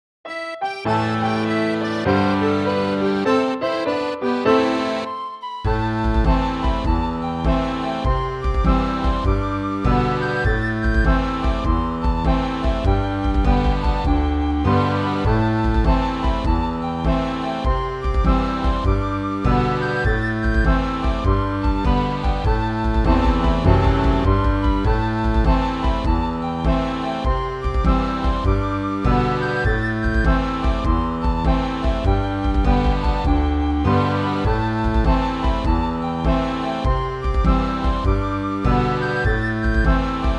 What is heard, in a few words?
guitar